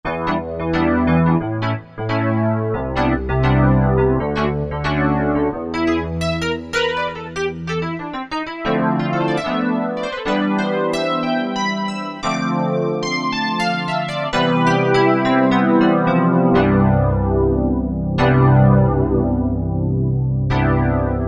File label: Super E.P., Korg T3